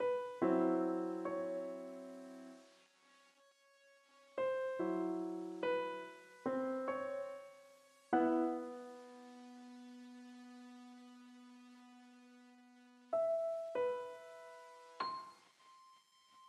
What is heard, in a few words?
Piano